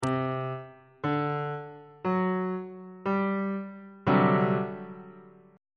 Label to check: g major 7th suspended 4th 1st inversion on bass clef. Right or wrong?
Right